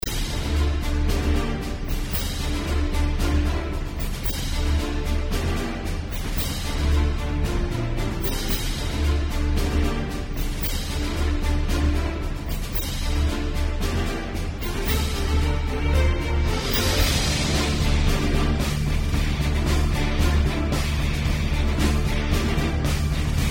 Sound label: waiting room loop